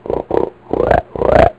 Hog Sound; ResEdit

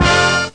Jazz Flash; Mac Mine Sweeper 4.1

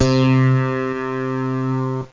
roland d50 chameleon, synths; Zoom - Release 2 (1996); Active Software

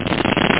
shuffle; crazy.8's

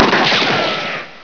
shoot; FastGun3

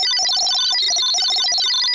milling wall; boulderdäsh; classic800xl

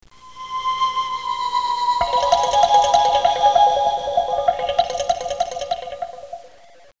nite

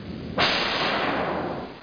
LAUNCH; 12000 Graphics Pack; Media Graphics

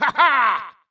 cheer

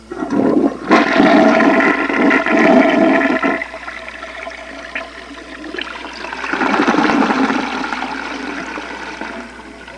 toilet

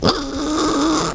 squeal, Piglet; SimPiglet; squeal3

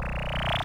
warp out; Revista CD Expert 51; PCGamer51; Laser Assault

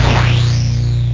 camera; Graliens

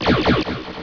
shred

